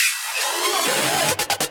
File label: Fill 140 BPM; Vengeance Samples; VEC3 Loops 140 BPM